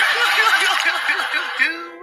funniest crying ever

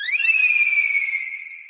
scream; ralsei

